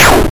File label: Gun Shot 8 Bit